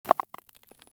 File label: parkour effect dull sound